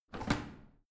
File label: washing machine drawer out quick